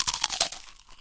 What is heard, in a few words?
eating chips